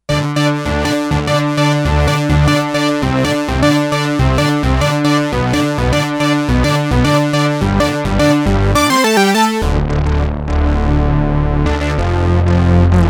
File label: Stack example, Sequential Circuits Six-Trak